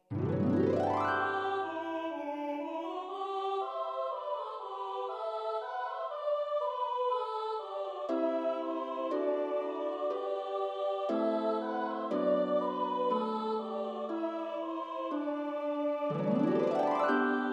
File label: Tenors